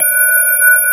METAL BAZZ

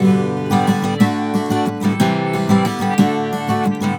GTR6, Celtic Flavours; USB Soundscan vol.42; AKAI

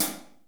HH 1M R, DRUMKIT

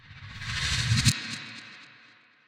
Reverse

